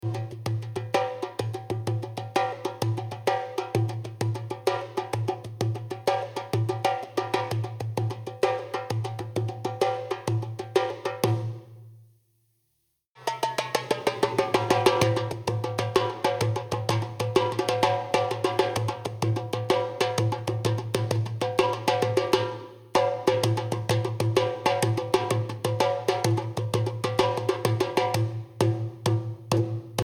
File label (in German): DARBUKA SOLO